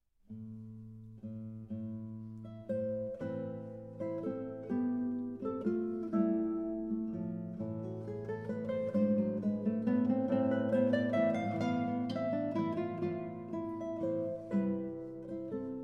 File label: Sonatina Canonica Tempo di siciliane